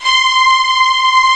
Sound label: STRING; Descent II